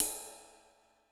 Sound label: Rider, Cymbal, Yamaha DTXpress-II